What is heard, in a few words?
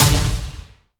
hit left; junisaber